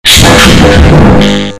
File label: smashing the keyboard